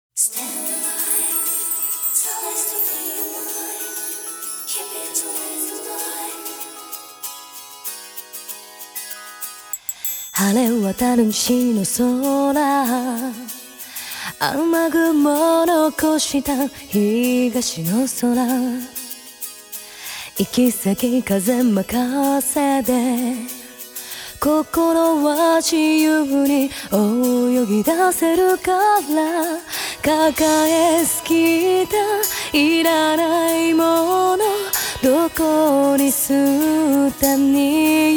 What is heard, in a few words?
Acapella; Yorinuki Gintama-san